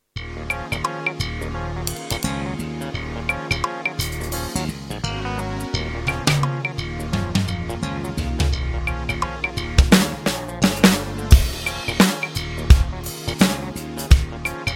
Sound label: playing a slow funk groove on the Roland TD-12 drum kit